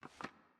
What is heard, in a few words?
bricks indent; cashomatic